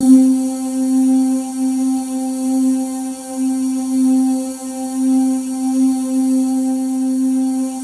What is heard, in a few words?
C4, Synth Leads